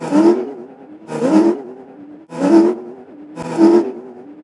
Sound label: ROTOR; USB Soundscan vol.33; AKAI; Disjointed Textures; TECHNICS